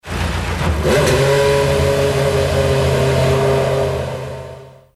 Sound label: F1 engine startup